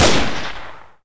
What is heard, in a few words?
shoot; toka